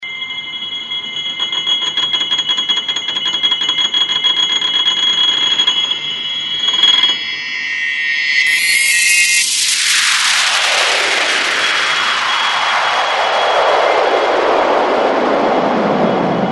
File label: piano